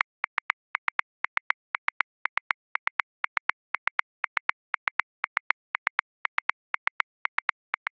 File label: Rhumba Shaker 16ths 135 bpm; Grooves; Ableton Live 12 Lite; Core Library